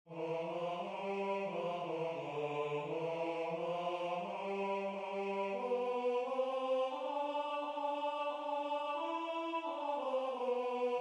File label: Tenor